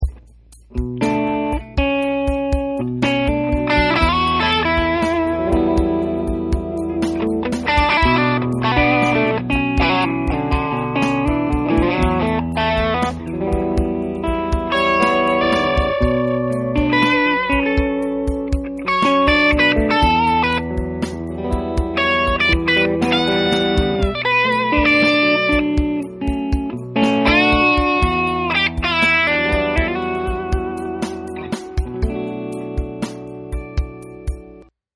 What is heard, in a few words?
Overdrive, guitars